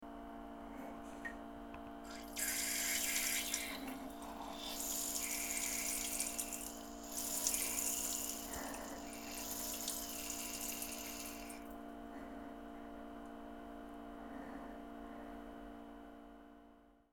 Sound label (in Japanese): 勢いのあるおしっこと吐息, 少女, トイレ